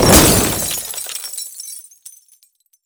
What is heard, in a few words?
break